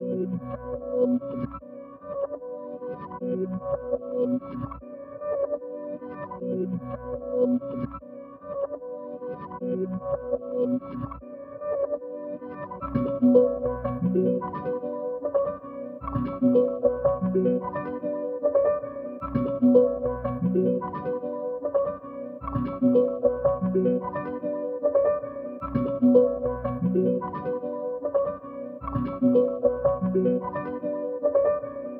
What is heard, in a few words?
150 BPM B Min Pad; Reverse; Loop Stems; Cymatics; Pushin; 2022 Melody Collection